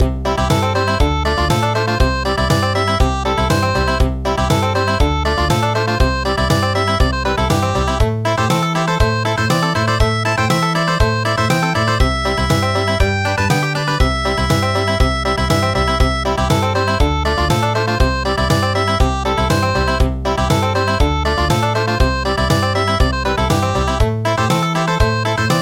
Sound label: Country; Thaleba